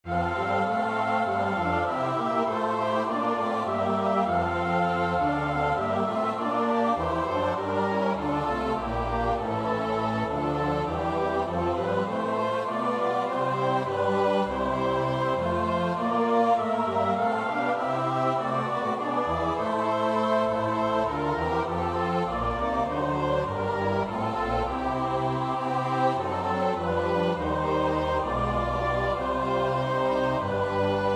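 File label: soprano alto tenor bass; Bach (Johann Sebastiaan); BWV 127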